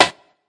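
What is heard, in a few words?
classic hh Snare; Hip Hop; STYLE KITS; Classic Hip Hop Mega Kit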